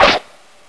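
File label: attack; flyclaw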